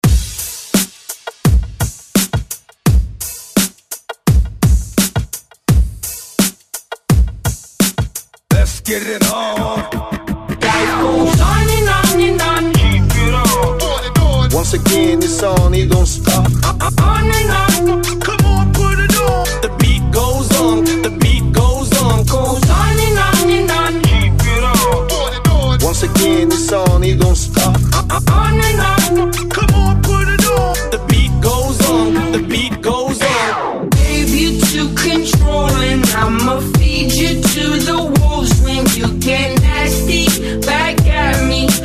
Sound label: redrum breaker hype 85 bpm